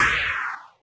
Drums; Distored Reality

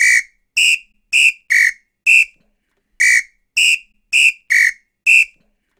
Samba Whistle; Sonic Foundry (Sony Creative Software); Joe Vitale Latin Percussion

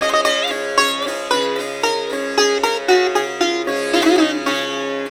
SIT, India; Best Service; Ethnic Journey Sound Cube

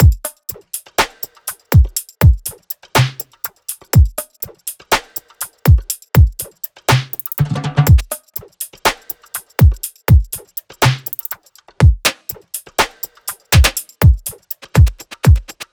loop full 122; Seismic Loops

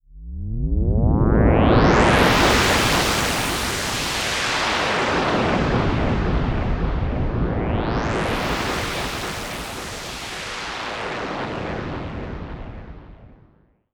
Big Noise Sweep; Trance Formation

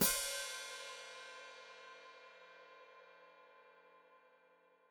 Hat Closed Open, Hi-Hat

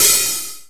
open hats A; Greytsounds; ADD-ONE KITS

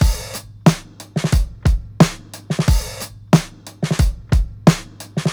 THICK BEAT, breaks, drums; Basement drums vol 2

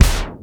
SYN KCK, SONIC DRUMS; Greytsounds VOL-1

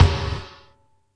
Gated Snare; Univerb; creative gate; Gated Reverb

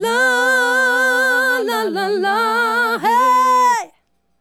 Female Group, Hip-Hop and RnB Vocals

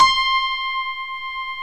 DIG ACU PIANO; OMI - Universe of Sounds

